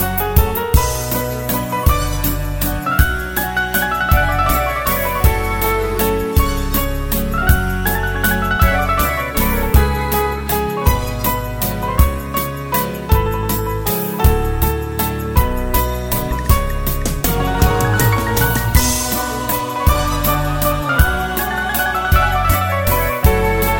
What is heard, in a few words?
Melody